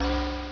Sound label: door; Midway Games; Smash TV